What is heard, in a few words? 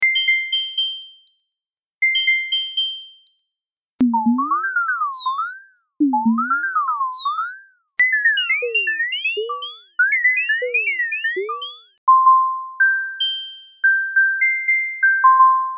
phrase random drops